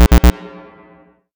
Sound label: error; Rollmorad